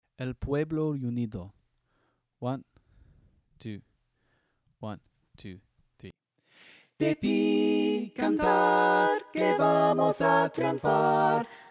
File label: soprano